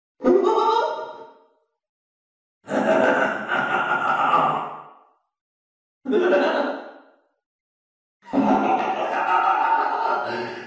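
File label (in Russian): смех с эхом; penniwaisa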